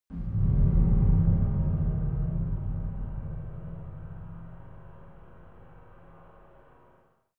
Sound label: scary metal chime; impacts